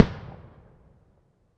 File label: mini explosion; weapons; starruler